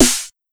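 00's Subtle Reverb Steel Snare Drum Sample C# Key, Royality free acoustic snare tuned to the C# note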